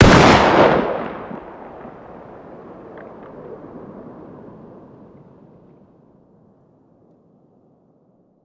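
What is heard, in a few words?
fire distant; gmod; arccw uc commons attachments; ub12ga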